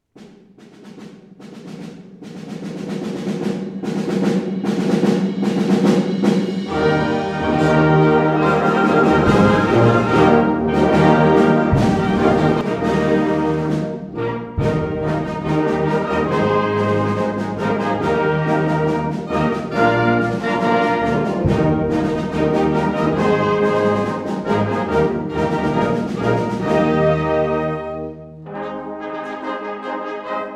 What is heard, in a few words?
Jubilee Fanfare